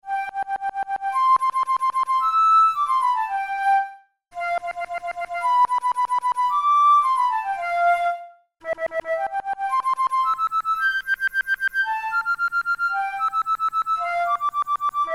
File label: methode pour la flute study; drouet